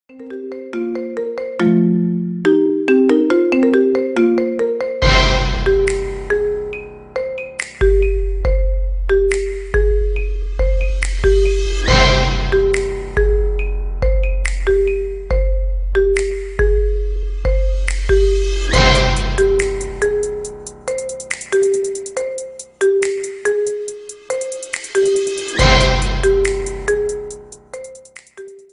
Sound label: marimba ringtone remix